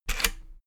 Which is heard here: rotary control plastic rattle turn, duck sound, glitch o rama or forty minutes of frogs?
rotary control plastic rattle turn